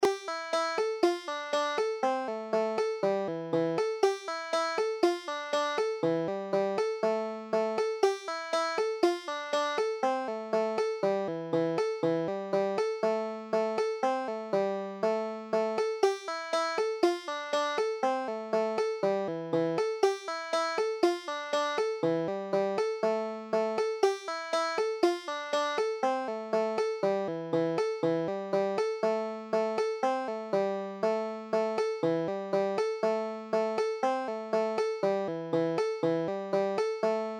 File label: just three, banjo